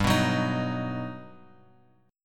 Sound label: G Augmented Major 7th, Bass Standard; G+M7 strummed; JGuitar